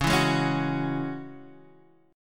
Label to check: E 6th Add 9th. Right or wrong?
Right